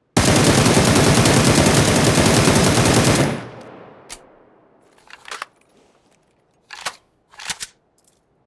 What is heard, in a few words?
Beryl full auto